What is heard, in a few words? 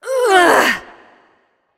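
grunt lift, female; smite; voicepack; hera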